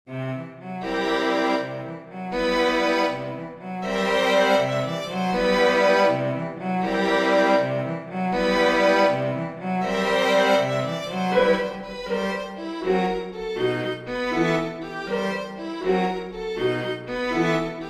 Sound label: string quintet